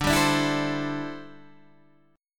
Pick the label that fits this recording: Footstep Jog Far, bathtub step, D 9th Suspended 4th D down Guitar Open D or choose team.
D 9th Suspended 4th D down Guitar Open D